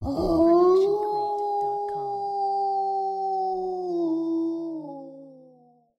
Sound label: wolf howl; soundscrate